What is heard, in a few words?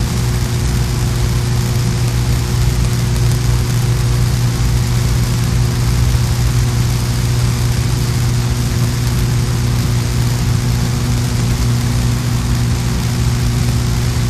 Power Plant Transfo, buzz & hums